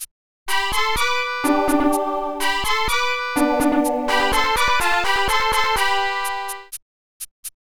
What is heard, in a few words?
tune